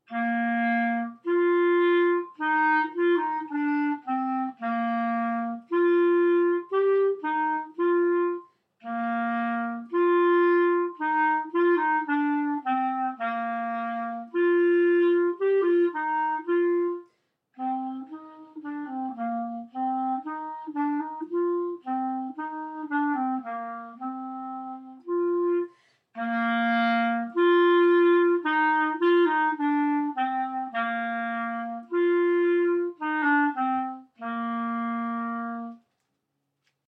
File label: Proclamation clarinet